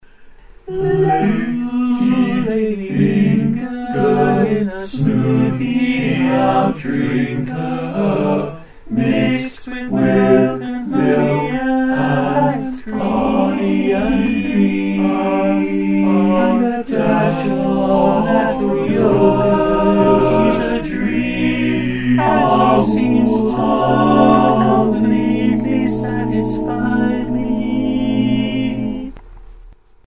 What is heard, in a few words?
TBB